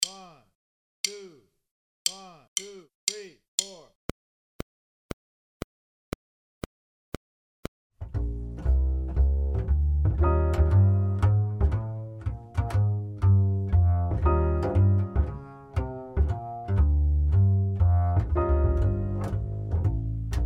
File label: Swing, Drum Fills; Essential Drum Fills (Erskine)